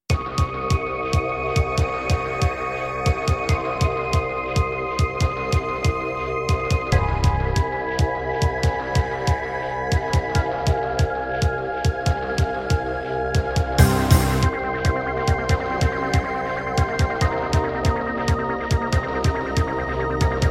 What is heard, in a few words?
Roland Edirol SD-20 GSMODE; sigil doom episode 5